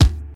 clear steel kick drum sound d key, bass drum sound tuned to the D note